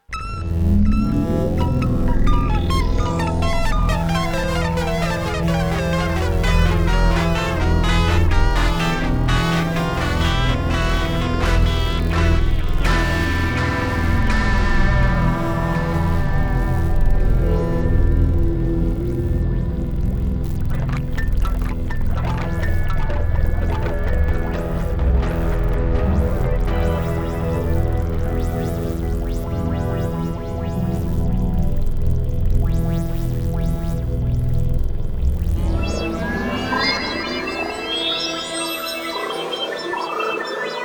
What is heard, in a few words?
space intro